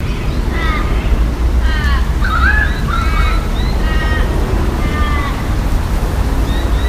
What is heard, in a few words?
Birds